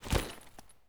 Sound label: gear fast stereo; Escape from Tarkov